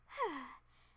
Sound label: Huff; Alice